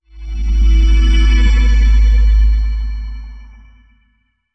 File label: Mysterious Shine Long Rumble Deep Modulation Glitter